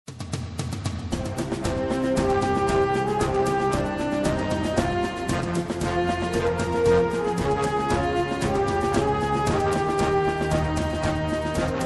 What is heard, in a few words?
Action Suspense